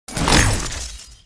hitting ice

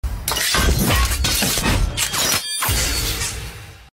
ironman space armor suit up

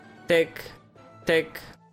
clock

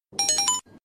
notification sound; gta

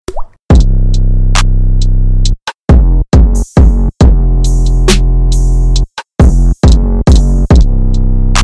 chicken wing beat instrumental

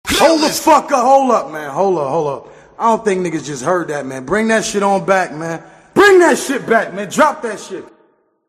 cool dj drop

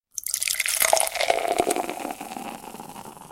beer pouring into glass sound

